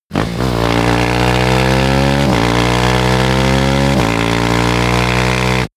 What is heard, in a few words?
motorcycle motorcross accelerate